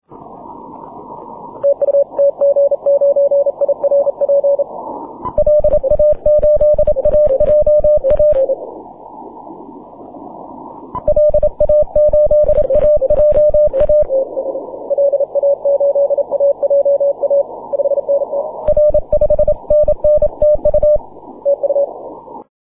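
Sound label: 15m continuous wave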